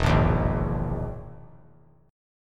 E Major 7th Flat 5th down; EM7b5 strummed; JGuitar

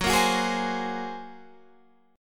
A sharp 7th Suspended 2nd Sharp 5th

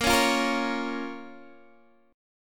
A sharp Minor A sharp down Guitar Open D

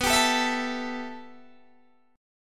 B 7th Suspended 4th Sharp 5th, Guitar, Open D

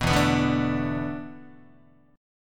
E 7th Suspended 4th, Guitar; E7sus4; strummed

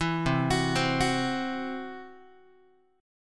C Major Flat 5th, Guitar; arpeggiated

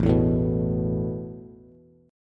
A Suspended 2nd A Bass; Asus2 strummed

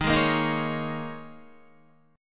Eb 7th Suspended 4th Eb, Guitar; Eb7sus4; strummed; 11,11,8,8,8,8; JGuitar

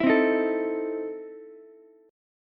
E Major 7th Suspended 4th Sharp 5th Ukulele; strummed; JGuitar

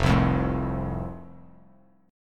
F Major, F Chord; strummed

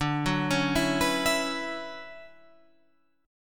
D Major 13th D down Guitar Half Step Down

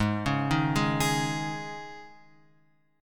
G sharp Suspended 4th G sharp; G#sus4 Chord {4 4 1 1 X 4} arpeggiated

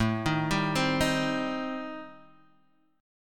A-Minor 7th Flat 5th-A-down, chord; Am7b5 arpeggiated; JGuitar

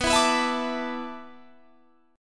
A Suspended 2nd Suspended 4th

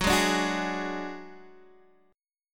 F sharp Suspended 2nd Sharp 5th, Guitar, Open D; chordshape; down

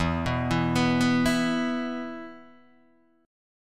E 5th, Guitar, DADGAD

